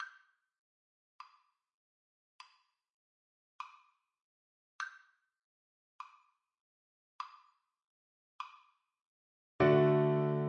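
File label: VLC karaoke, Cello; beethoven; ode to joy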